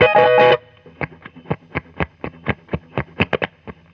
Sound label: GTR2; USB Soundscan vol.32; Roots Disco; AKAI